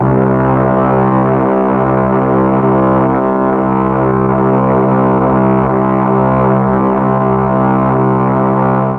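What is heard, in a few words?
C2 half speed brass, mellotron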